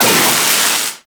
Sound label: Zap Fire; Trapper